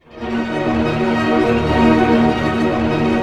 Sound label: ARP D#, STR ARP MIN; Sound On Sound - Peter Siedleczek's Orchestra